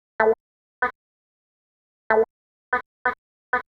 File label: 126 A MUSIC; funky; Loopmasters; Funky Underground Vol 2